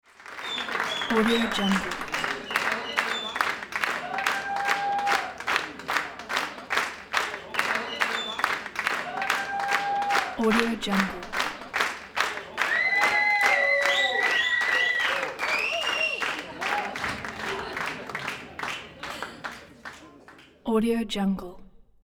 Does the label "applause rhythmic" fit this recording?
Yes